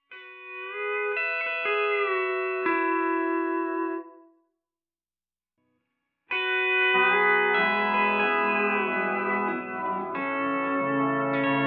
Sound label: Octave Behind; Strymon TimeLine MX